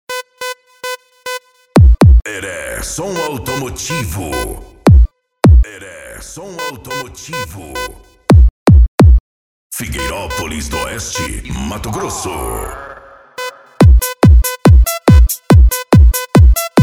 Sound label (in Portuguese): som automotivo